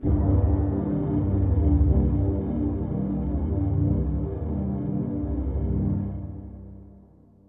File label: synth pad ambient 160 C; Sphere; eJay